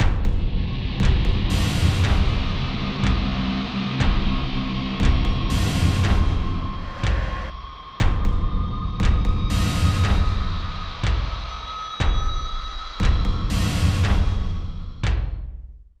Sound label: Danger Tonal; Insanely Twisted Shadow Planet